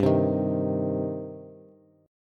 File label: G Suspended 4th Sharp 5th, Bass; strummed; x,10,10,8